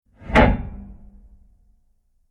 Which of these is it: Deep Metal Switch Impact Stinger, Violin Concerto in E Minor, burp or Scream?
Deep Metal Switch Impact Stinger